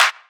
Clap; Equipped Music; Loungin House

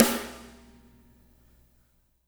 SLAM HOP

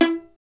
STR VLN, Solo Instruments; Miroslav Vitous

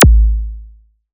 ZAP, ZAPS THUMPS; Best Service Alpha Dance vol.2

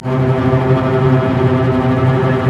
STR, Roland LA Composer

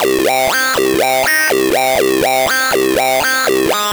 C 122, Synth Loops, Acidized; 122bpm; Zero-G; Soundclash Nu Dance Electro

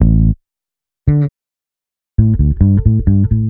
BASS, Roots Funk; USB Soundscan vol.41; AKAI

